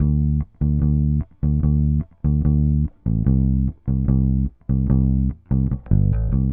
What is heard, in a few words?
Bass, Indie Rock